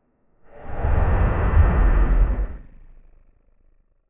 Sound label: healing